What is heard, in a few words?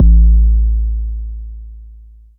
909 BD Long Tape; Goldbaby Tape808 & Tape909; The Tape 909